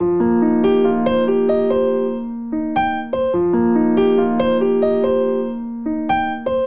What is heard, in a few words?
Ostinato Free F Minor 72 bpm, Grand Piano; MIDI Clips; Ableton